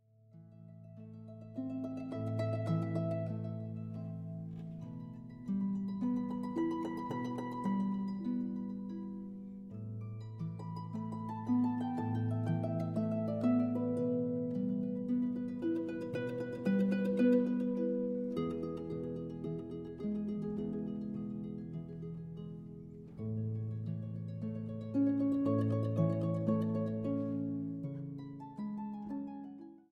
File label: Harp; Habanera; Alberti